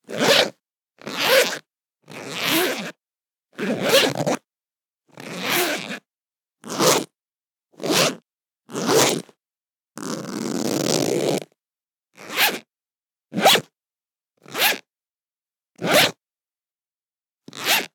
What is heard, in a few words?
Zips